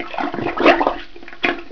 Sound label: MUDSLURP